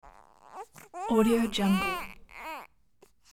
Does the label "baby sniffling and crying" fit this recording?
Yes